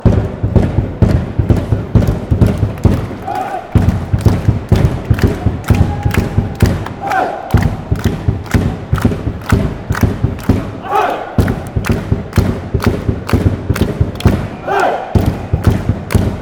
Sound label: drums hey